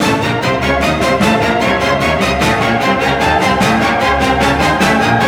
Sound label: mob start; zombie plague